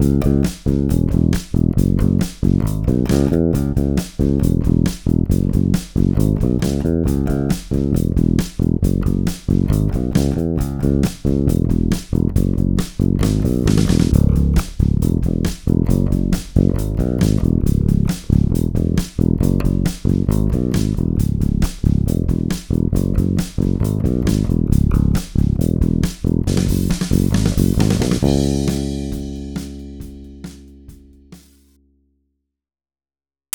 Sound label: rock